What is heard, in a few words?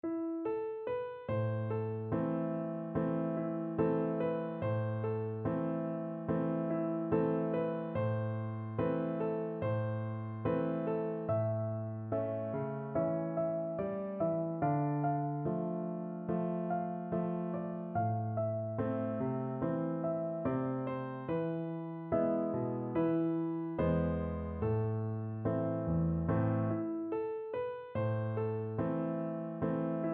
bella ciao, piano duet